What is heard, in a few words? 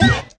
Frighten